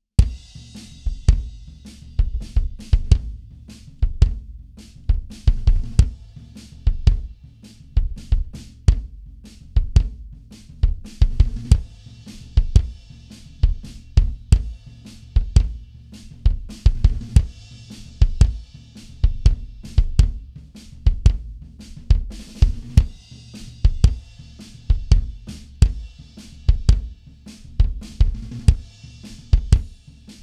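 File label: bass drum, drums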